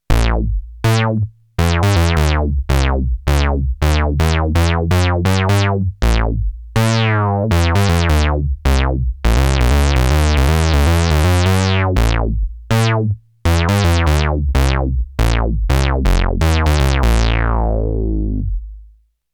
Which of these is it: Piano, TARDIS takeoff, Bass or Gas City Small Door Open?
Bass